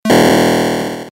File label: start moving; snail against squirrel